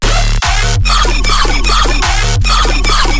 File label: bass loop 150 D; Virtual Riot